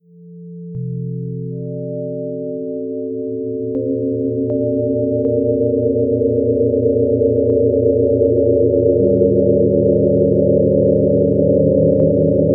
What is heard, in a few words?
death sound, horror; 誘い３/試聴注意